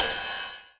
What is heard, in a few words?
909 Ride, Drum Machines